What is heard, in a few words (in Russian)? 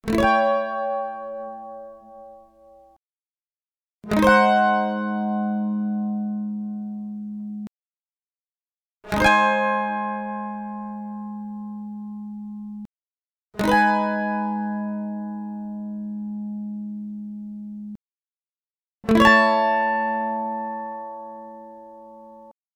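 звуки мандолина mandolin chord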